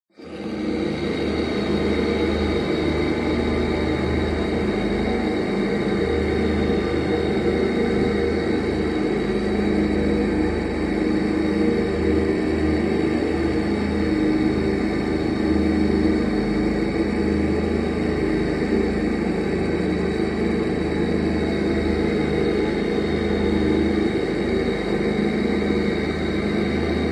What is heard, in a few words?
science fiction atmosphere throaty growling dark menacing